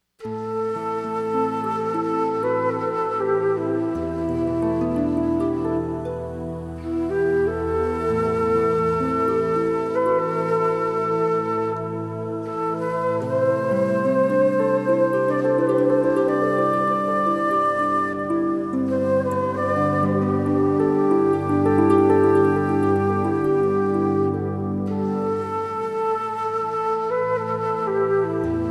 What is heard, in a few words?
Flute Theme